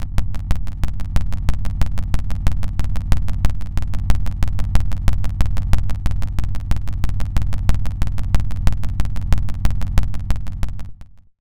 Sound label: PULSE, Analog Fantasies; USB Soundscan vol.49; AKAI